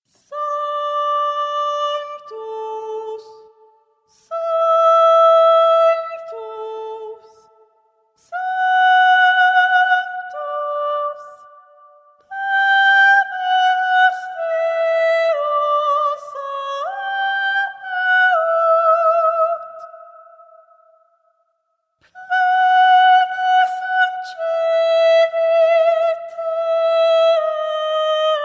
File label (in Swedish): sopran; Sanctus